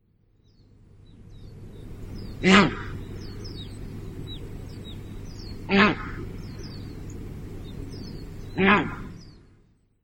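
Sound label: Swan Goose; カモ目; サカツラガン; 山形県飛島; 簗川堅治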